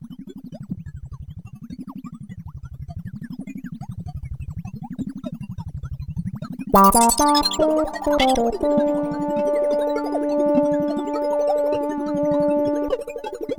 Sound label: A8 S Hold Trigger